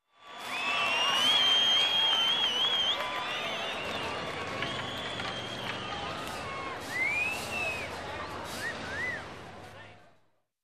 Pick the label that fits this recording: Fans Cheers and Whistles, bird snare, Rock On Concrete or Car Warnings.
Fans Cheers and Whistles